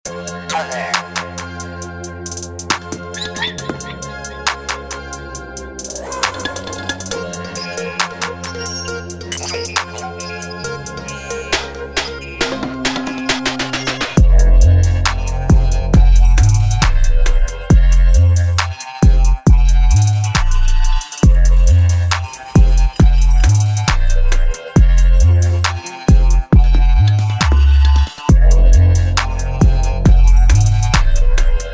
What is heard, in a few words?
Trap Rap